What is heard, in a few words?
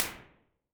small prehistoric cave